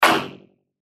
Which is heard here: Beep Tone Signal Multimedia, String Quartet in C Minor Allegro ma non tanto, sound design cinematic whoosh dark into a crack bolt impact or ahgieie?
Beep Tone Signal Multimedia